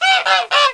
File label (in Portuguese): pato; GEOGRAFIA